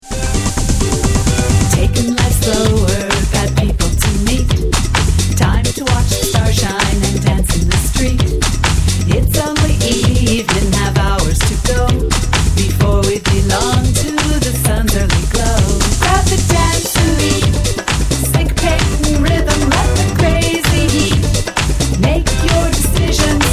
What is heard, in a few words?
Dancin Beat